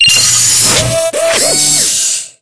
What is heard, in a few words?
sphere socket open; Source Engine; Portal 2